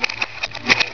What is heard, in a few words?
PROJCTOR